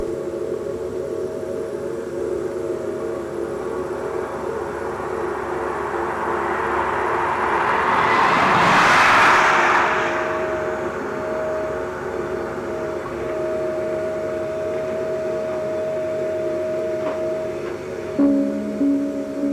trainn

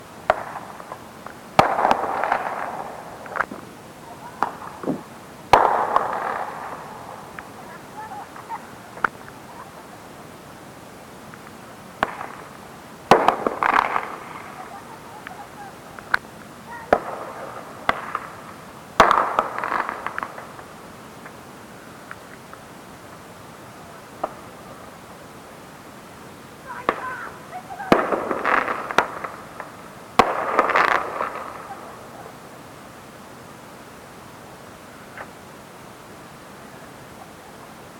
firecrack

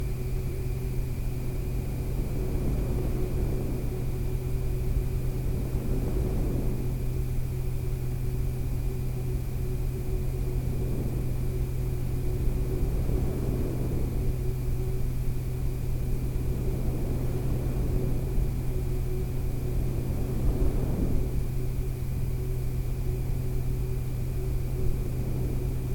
wave and motor

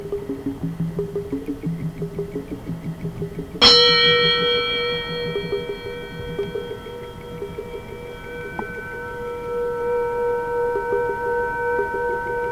bell

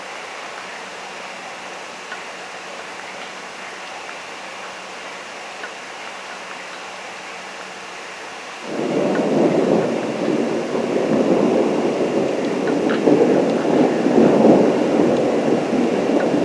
thunder mild drums; thunder with milddrums

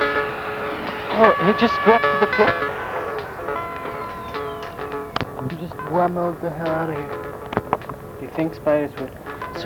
whammo

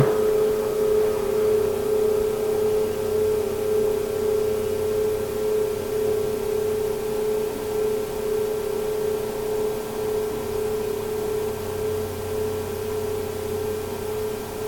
resonance w bird

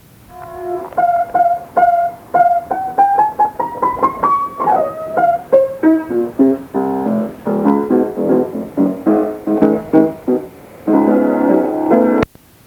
piano

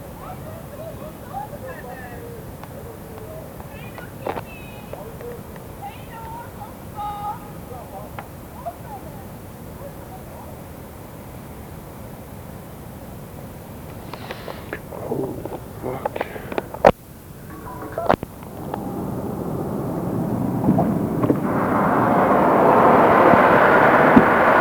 rocket fuel

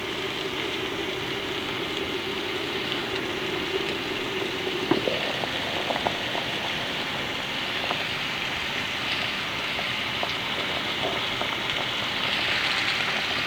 boiling

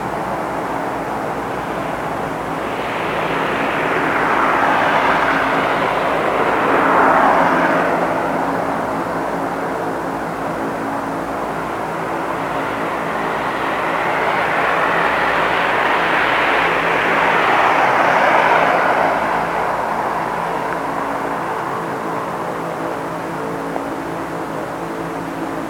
car

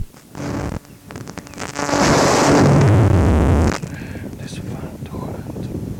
sony noise

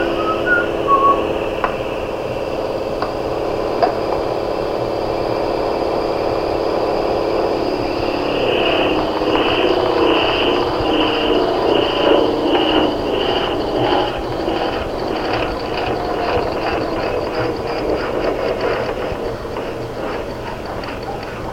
plane; landy plane

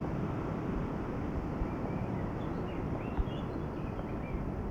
bird

